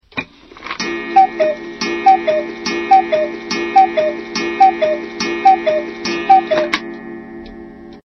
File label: Ringtone